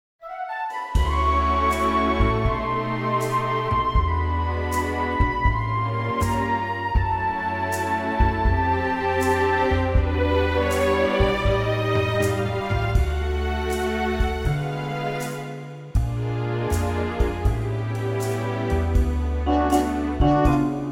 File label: D orchestral